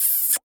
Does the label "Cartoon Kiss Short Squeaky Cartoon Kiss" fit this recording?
Yes